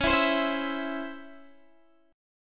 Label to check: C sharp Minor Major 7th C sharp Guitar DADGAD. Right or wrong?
Right